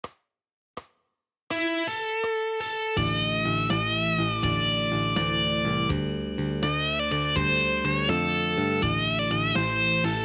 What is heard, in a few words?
Bluesrock